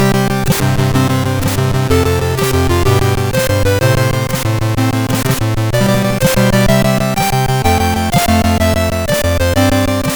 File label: sid, MOS6581R3; Wings Of Fury remix